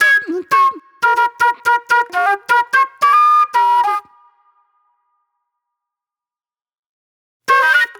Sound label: Super Soul Flute